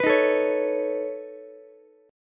C sharp 7th Suspended 2nd Sharp 5th, C sharp, down, Ukulele; strummed; chord